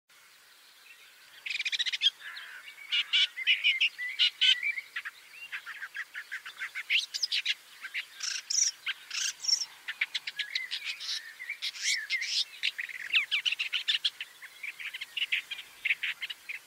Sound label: Woodchat shrike